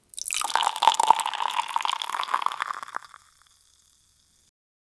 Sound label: two tablespoons of sugar; beverage